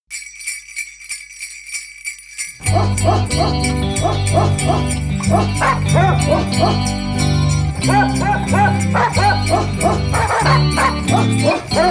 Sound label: WINTER DOGS SOUND